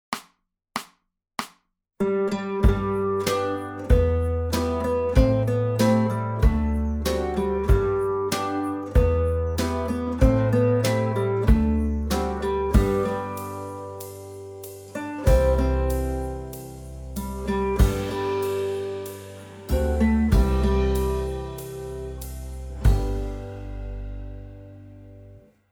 Regenlied Melodie, Gitarrenschule; Titel 24 – Regenlied (Melodie)

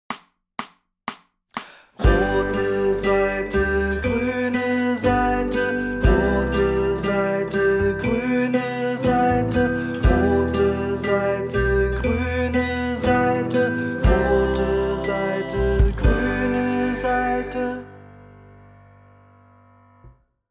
Rote Saite Gruene Saite, Gitarrenschule; Titel 7 – Rote Saite – Grüne Saite; die bunte gitarrenschule band 1

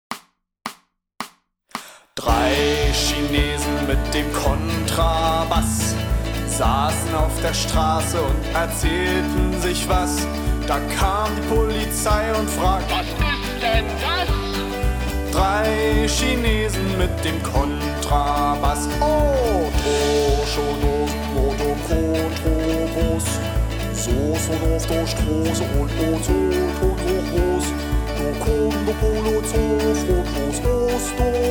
Drei Chinesen mit dem Kontrabass; die bunte gitarrenschule band 1

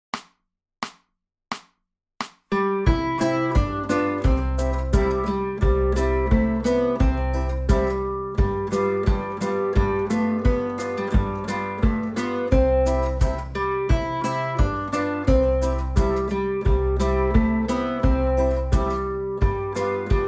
Eisbaerlied Melodie

